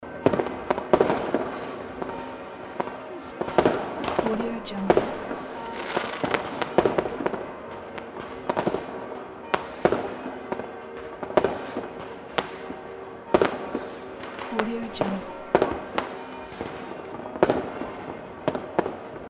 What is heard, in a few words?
fireworks with bell ringing